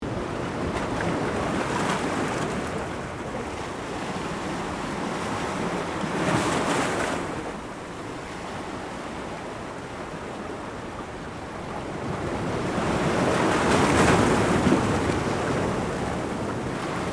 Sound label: Powerful ocean waves crashing loop